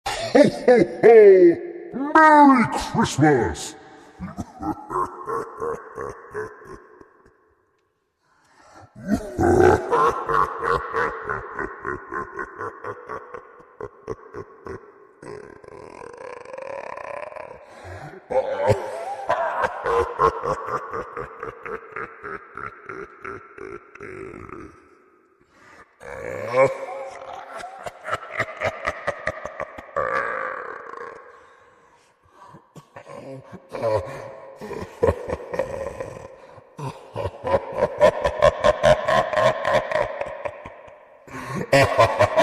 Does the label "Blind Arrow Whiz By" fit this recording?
No